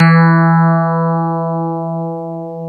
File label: FM Rhodes; Club-50 - Foundations Roland